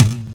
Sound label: tom, drums; virtual drum sounds; custom drums